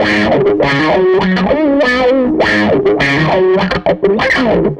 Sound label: Tele Guitar Loop